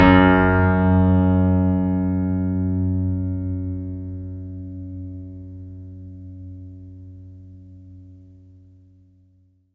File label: F#2, Synth Line; 90 s Sample CDs; NiceBeats; SynthLine VOL-22